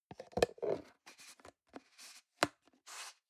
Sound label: plastic can small lid screw close soft